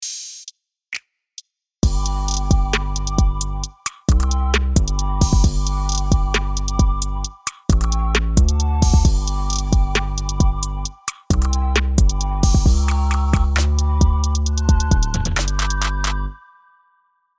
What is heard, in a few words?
Hiphop